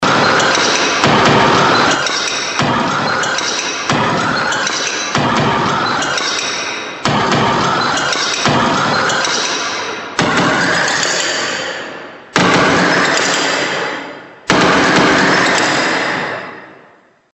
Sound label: break